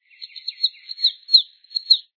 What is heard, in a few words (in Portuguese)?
saira de chapeu preto